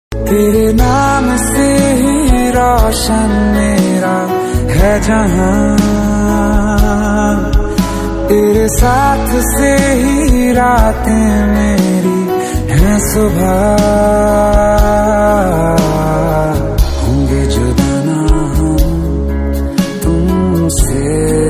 Romantic Love Ringtone

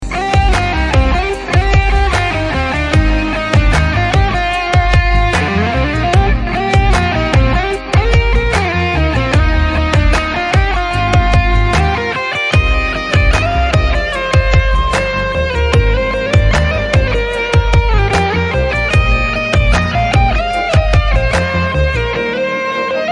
Instrumental Ringtone

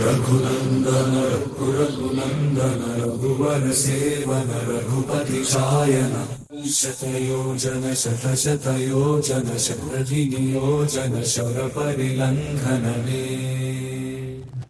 Hanuman Mantra Bgm Ringtone

